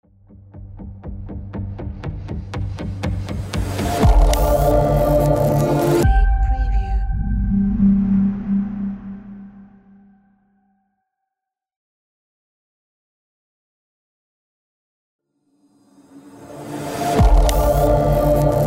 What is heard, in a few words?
Futuristic Hybrid Glitch Logo